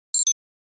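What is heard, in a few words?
Flash Beep Electronic Double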